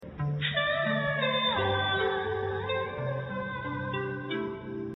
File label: midi chinese ghost story